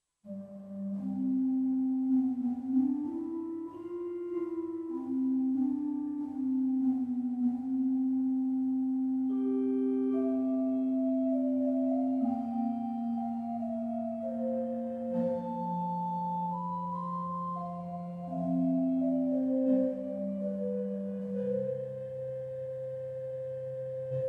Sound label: Keys Organ Manual Bourdon; TO Instruments